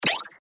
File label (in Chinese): 移动